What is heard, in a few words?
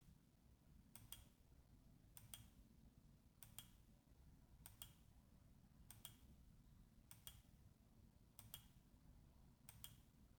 logicool g502 x plus right click sound